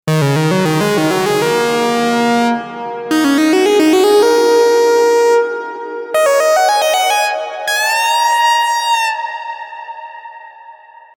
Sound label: Lead classic analog